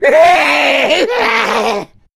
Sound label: jockey, voice alert; Left 4 Dead 2